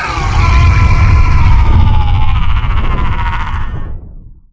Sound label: death; dred